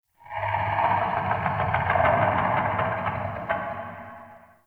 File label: Root Cave Root Creak Large; Ambience; One Offs; Neathuns Root Cave